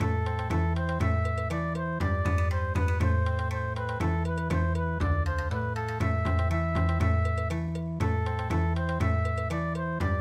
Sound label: March Bon Odori; Qazan March